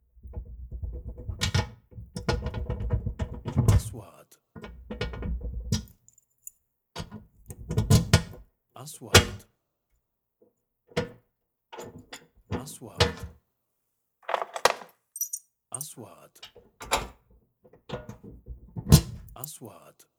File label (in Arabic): فتح خزنة صندوق باب حديد